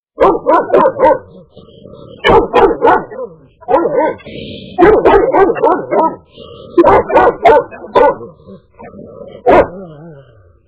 angry dog

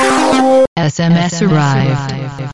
sms arrived

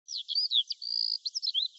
love birds sms

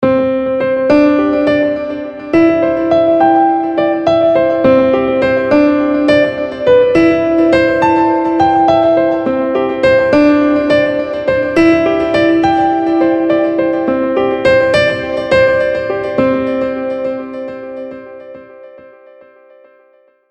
delay piano

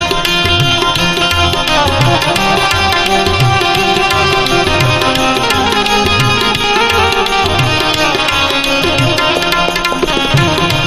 instrumental

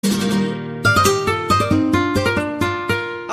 chitar nokia sms

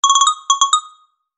roller sms

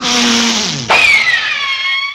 funny sneez

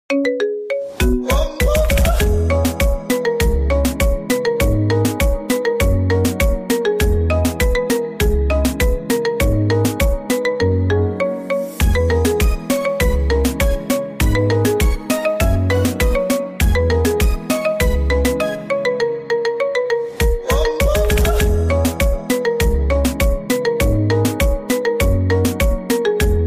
(Marimba)

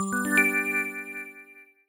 signal

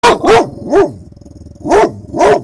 pitbull deep bark

